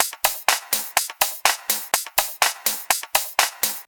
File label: top, 124